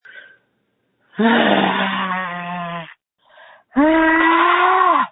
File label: screams